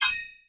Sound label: timer; tetrio